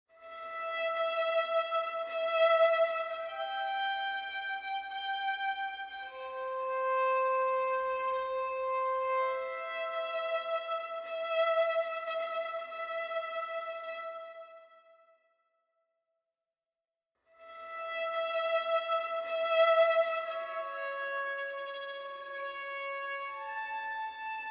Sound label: Violin Only